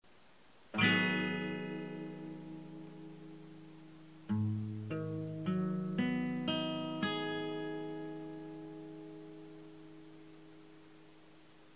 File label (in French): Accord G-diese-m7